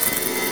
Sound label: WET, WATER; AKAI S6000 CD-ROM - Volume 1